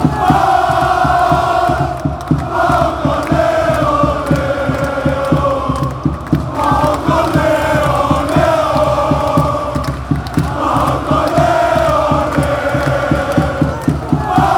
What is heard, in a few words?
chants, greece